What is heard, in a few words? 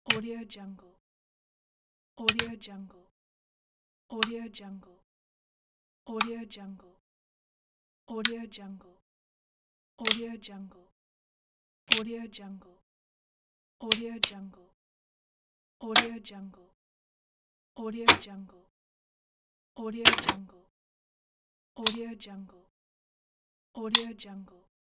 switches